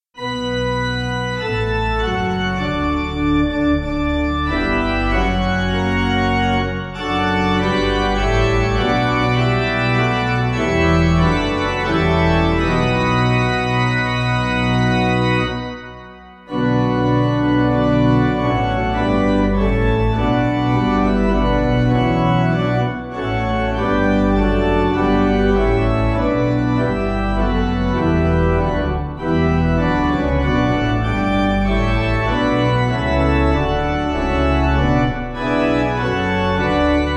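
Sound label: Pipe, Pipe Organ; Farmborough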